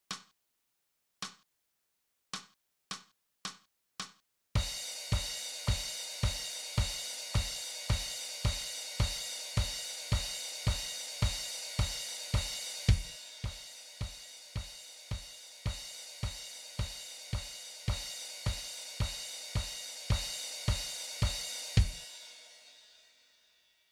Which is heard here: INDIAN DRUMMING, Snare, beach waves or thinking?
Snare